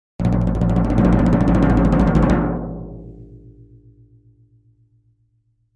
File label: Drums Toms C Low Roll